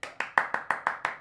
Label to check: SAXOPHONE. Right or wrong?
Wrong